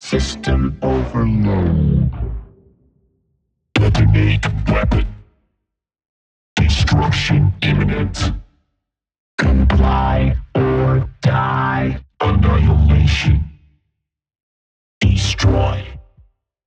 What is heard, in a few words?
VOCAL PHRASES